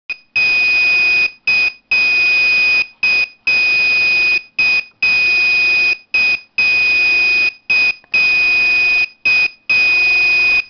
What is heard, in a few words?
Beepcode NC Communication